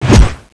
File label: axe slash, weapons